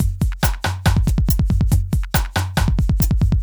ind 140 kit

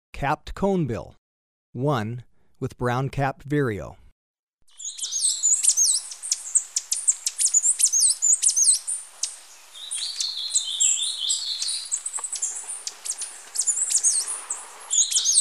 Capped Conebill, Birds of Ecuador; S. America

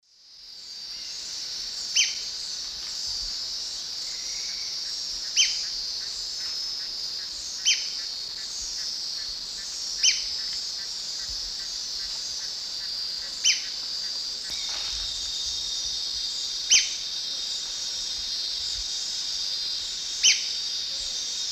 Platyrinchus platyrhynchos, calls; S. America; Eastern Lowlands

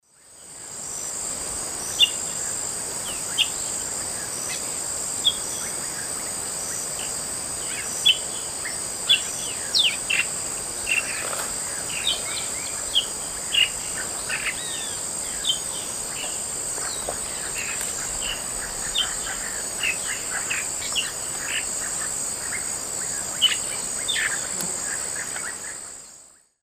Gymnomystax mexicanus, calls; S. America; Eastern Lowlands

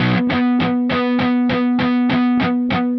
Riffing In Five, Rock, Guitars

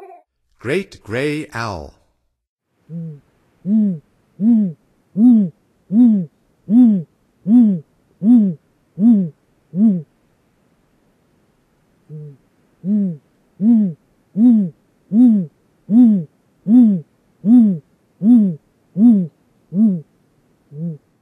Great Gray Owl; North America; Stokes

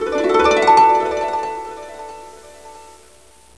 HARP

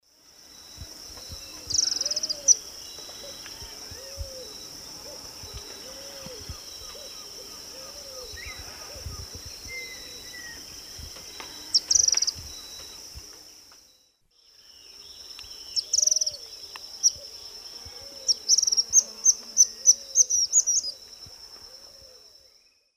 Tyrannus albogularis, calls and song; S. America; Eastern Lowlands

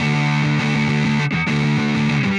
Pushing Riff, Rock Guitars

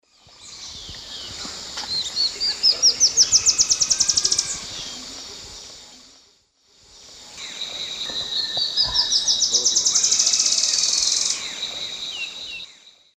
Terenura humeralis, song; S. America; Eastern Lowlands

